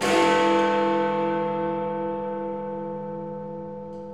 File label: ben strike, church clock strikes; sound effects and sound reinforcement